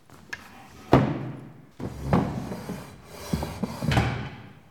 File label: Chair Noise